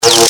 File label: good pain; CAC ASSETS